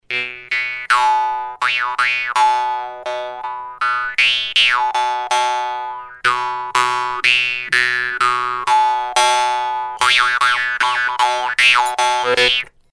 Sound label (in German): Maultrommel Morchang Superb; maultrommel morchang mohan superb